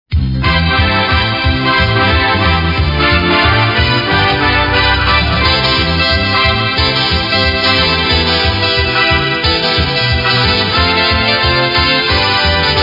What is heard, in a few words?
Beat